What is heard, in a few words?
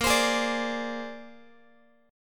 A sharp 9th A sharp Guitar; strummed; JGuitar